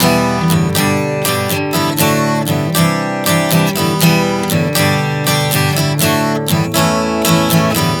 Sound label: Em-G-D-C A 120 bpm, acoustic guitar chord progressions